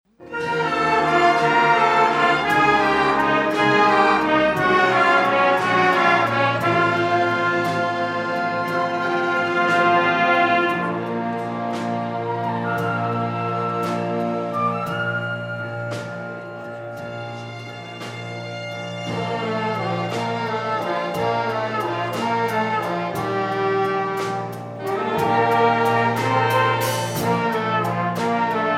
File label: Orchestra